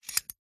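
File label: household bolt metal lock or unlock held in hand; zapsplat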